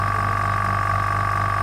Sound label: large electric air compressor motor loop